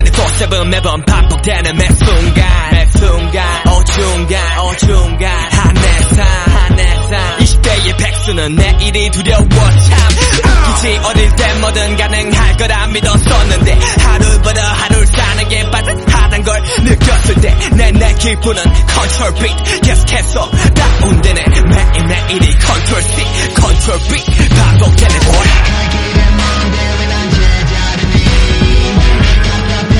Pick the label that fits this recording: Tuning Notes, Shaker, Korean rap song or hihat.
Korean rap song